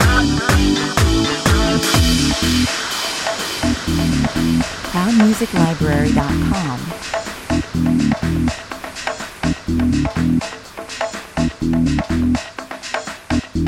no drums 124 bpm

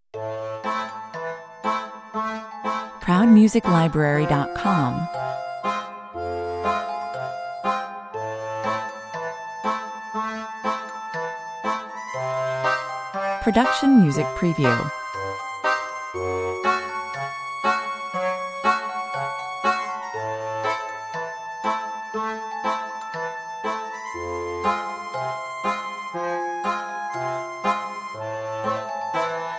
mysterious accordions

